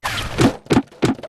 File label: barrel spin hit hit; cooper